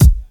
dry bass drum f sharp key

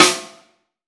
dirty steel snare drum sample f sharp key

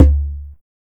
low tom drum sound d key

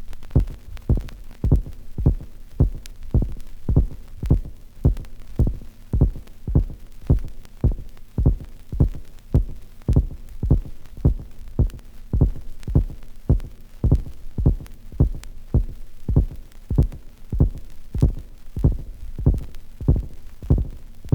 robot - monster heartbeat; vinyl sample

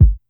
fluffy bass drum single shot G sharp key; Duration 0:01; Samplerate 44100 Hz

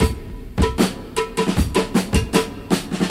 78 bpm breakbeat c key

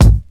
natural hip hop kick drum sound g sharp key

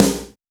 round steel snare drum g key; snare drum samples

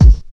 warm sounding steel kick drum sound g sharp key

